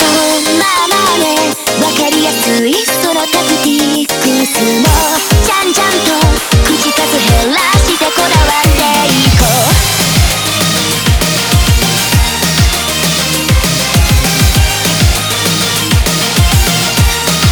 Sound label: BGM Reach